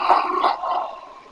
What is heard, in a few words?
hahaha